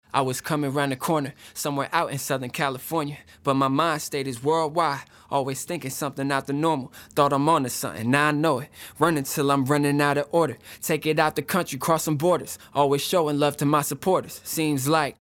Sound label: PuigTec EQP-1A, EQ; 人声; Waves